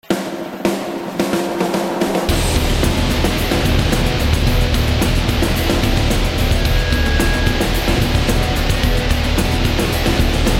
Rock

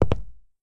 Footsteps Concrete Shoes; Oneshot Footsteps

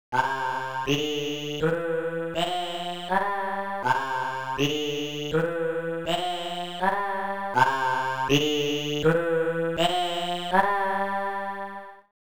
Speaker Test; Valkyrie no Densetsu; Namco System 2

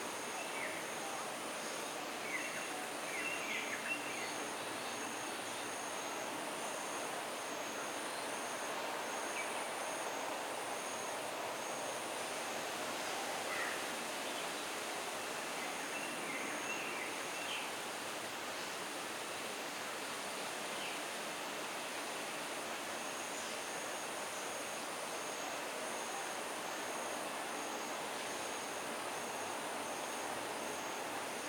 Amb Base Day; Botanist

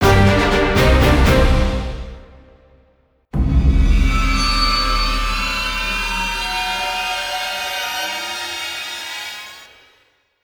Title Jingle; Deadstorm Pirates

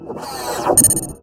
Ghoul Prepare Attack

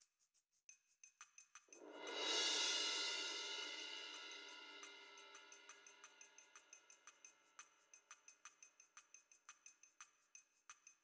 Percs

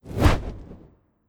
Torch

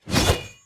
Final boss Pierce attack

